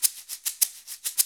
shakers

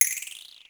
DR-660 FX; Rubber Chicken Software Sample Bank

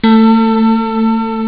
Nice bell sound; jazz jackrabbit 1; M8

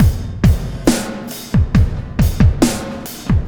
Landing Party Beat, Processed Drumkits; Sonic Foundry (Sony Creative Software); Processed Drumkits Zero Gravity Beats

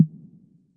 snap, Yamaha Tenori-On Snapkit

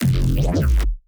neuro bass

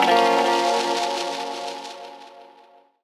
RAW DIRT FX; Rawcutz - Nu Forms; Gbm

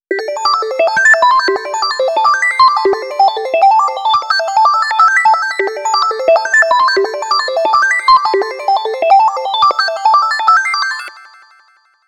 liquid sine pluck; lead arp chord melody

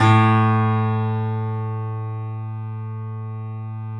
3D Pianos, Yamaha; E-MU Producer Series Vol. 5; 3-D Audio Collection; Yama Hard

